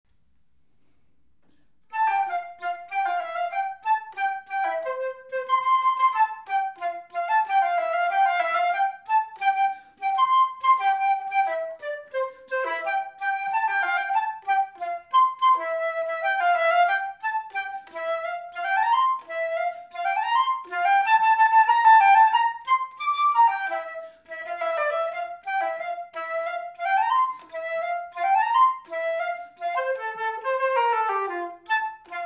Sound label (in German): Querfloete